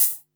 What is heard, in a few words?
Open Hats, Cymbals